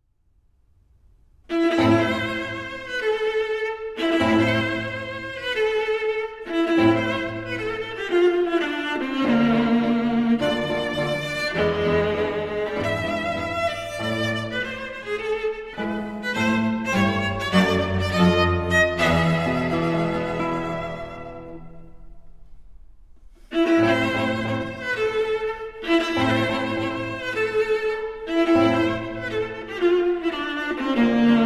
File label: Quartet in A Minor, Maestoso